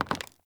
Foley Movement; Characters; Enemy; Mockeries

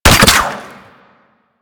Grapple Fire

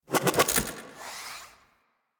Dispensary Open; Warframe; Protea

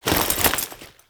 Foley Weapon Swap; Warframe; Kahl

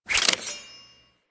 Throwing Dagger Reload; Warframe; Weapons

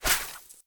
Lantern Net Break B; Ode Lantern Crate

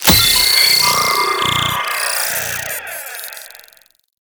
Hack Console; Missions; Railjack

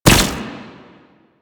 Archgun Projectile Explode; Warframe; Archwing

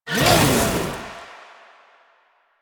Power Slide Start, Motorcycle; Motorcycle Ninety Nine; Bullet Jump

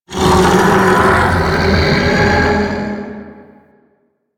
Chain Reaction Beam Vocal; Warframe; Warframes; Qorvex

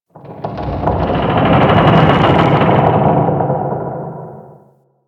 Settlement Low Rock Creak; Ambience; Warframe; Grineer Settlement

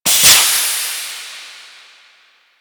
Animal Foundry Stasis Steam; Tenno; Warframe; Liset; Hub

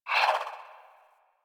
Swing; Balance One; Warframe; Weapons; Melee; Modular Melee

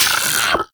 Arachnoid Vocal Pain; Warframe; Corpus; Arachnoid Hunger

